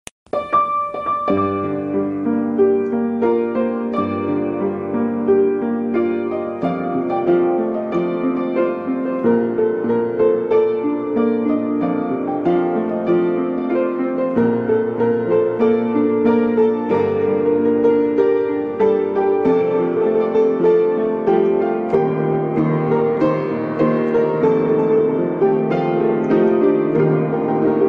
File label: Piano